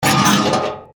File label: machine scream